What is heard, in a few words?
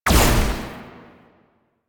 Amp Silenced Shot, Weapons; Warframe; Operators; Amplifiers; Drifter